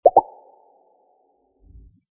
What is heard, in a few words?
interact